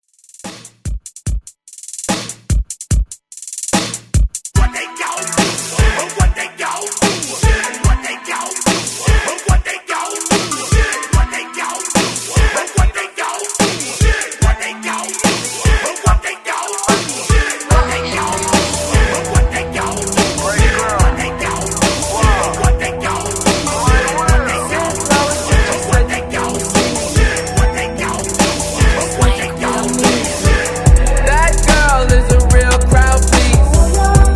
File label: Hype ReDrum 73Bpm; DJDOLLS